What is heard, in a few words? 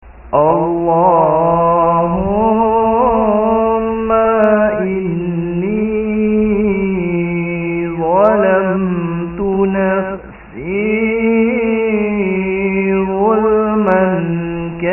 taubah echo